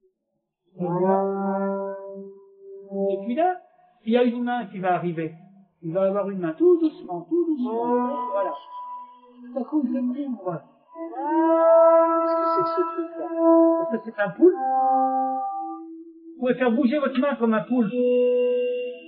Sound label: vocals